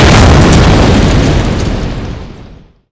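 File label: explosion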